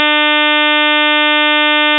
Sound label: 300 to 3600Hz all multiples